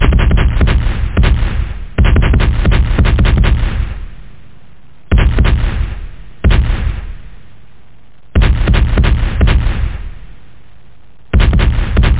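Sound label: cannon fire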